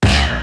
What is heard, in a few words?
D4, Crazzy Perc Set, CASIO FZ-1; Best Service ProSamples vol.55; Retro Sampler